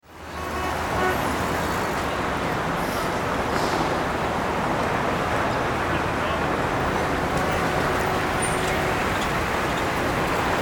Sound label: Traffic City